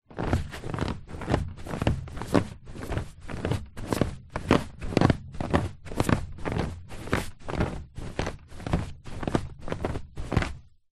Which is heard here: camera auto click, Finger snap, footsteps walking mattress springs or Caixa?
footsteps walking mattress springs